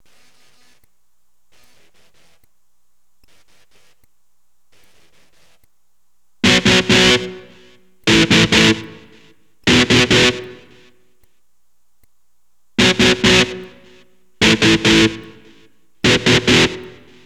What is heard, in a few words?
e gTR